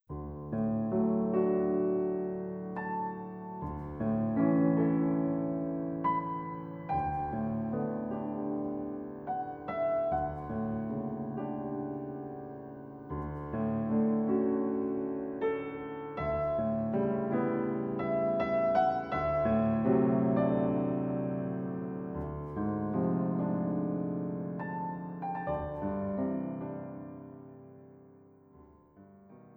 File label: Lullaby; Wiegenlied